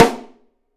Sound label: Drums, MARCHING; 3D Drums; OP MARCHING